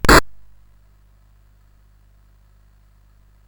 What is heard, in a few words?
CSG8580R5, GAMES; Street Rod